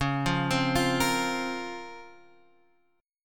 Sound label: Db Major 13th, DbM13 chord; arpeggiated; JGuitar